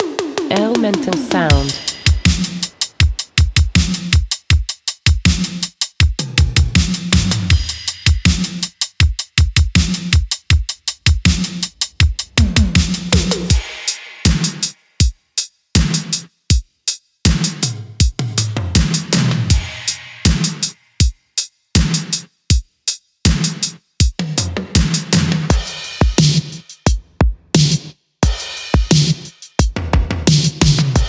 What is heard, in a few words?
Synthwave Drums